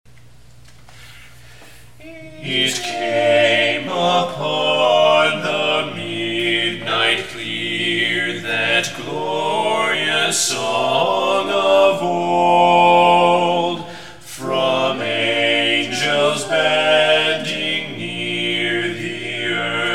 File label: it came upon the midnight clear bass